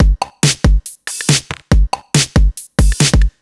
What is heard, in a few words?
Breakbeat 140 BPM